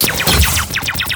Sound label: Attack; Zeus